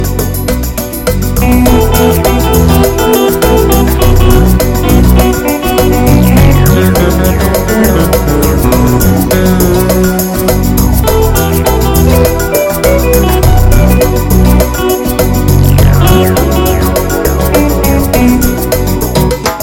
Beautiful Minor Samba

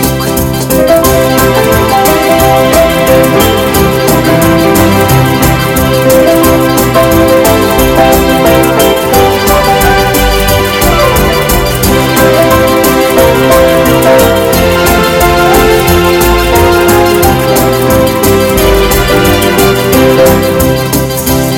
Hot and Fast

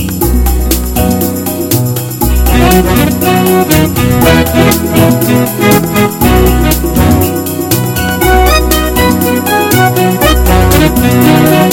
Pretty Cool Latin Jazz

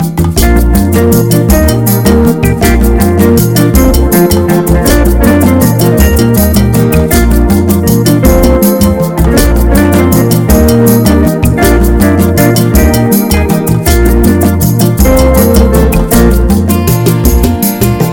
Quick and Happy

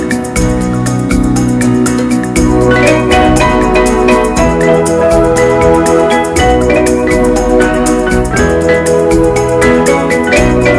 Smooth Meditation

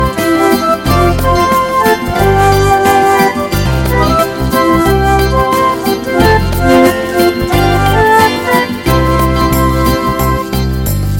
Smooth Improvising

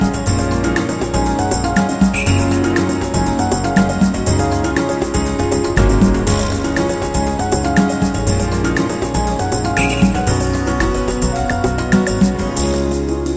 A Happy Beat

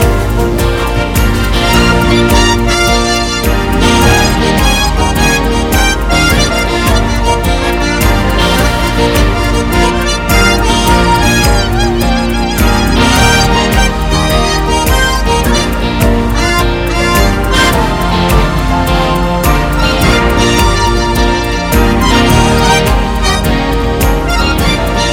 Hot Blues

